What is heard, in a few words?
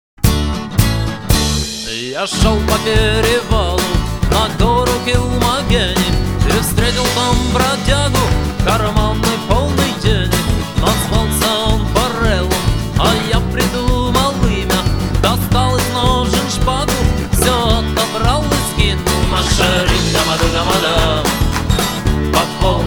Irish song; Whiskey in the Jar